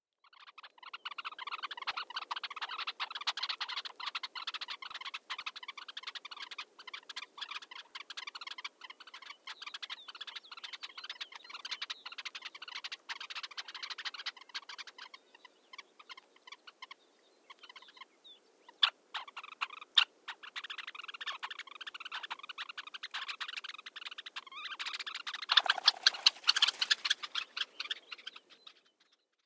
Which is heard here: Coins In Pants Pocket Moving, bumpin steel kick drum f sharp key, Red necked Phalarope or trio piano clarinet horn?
Red necked Phalarope